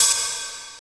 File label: snare+hat; DRUMS